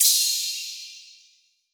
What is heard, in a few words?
Cymbal, Hip Hop; Drum One Shots; Seven